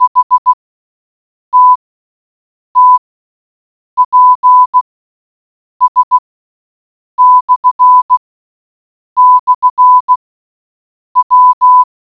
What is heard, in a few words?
morse code